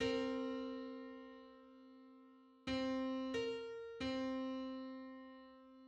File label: Nine-hundred-first harmonic on C